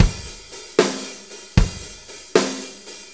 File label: 6-8 Loop, Full Kit Loops; Drum Tools